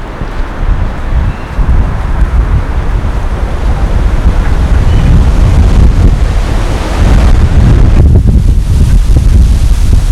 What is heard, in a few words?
wind